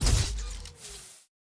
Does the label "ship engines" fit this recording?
Yes